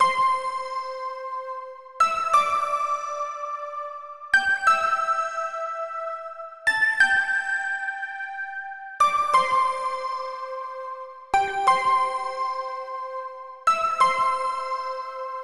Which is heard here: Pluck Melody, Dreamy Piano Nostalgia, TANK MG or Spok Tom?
Pluck Melody